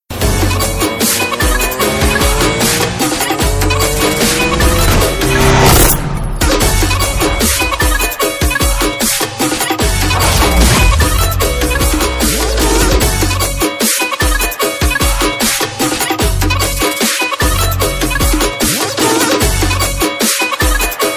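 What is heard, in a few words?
movie trailer background music ringtone; maanaadu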